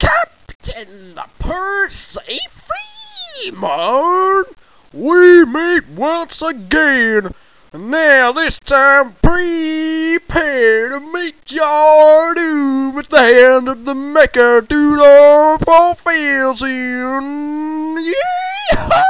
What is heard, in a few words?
appear; kurtz